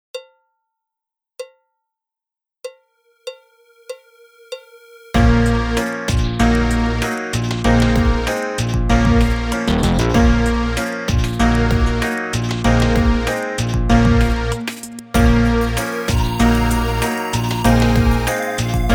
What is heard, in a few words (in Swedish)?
Meteorit, trombon trumpet